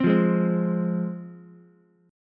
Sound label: C Suspended 4th Sharp 5th C Ukulele; Csus4#5 strummed; JGuitar